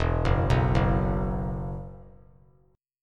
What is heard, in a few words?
E Suspended 2nd, down; Esus2 arpeggiated